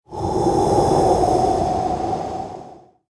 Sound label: airy smooth transition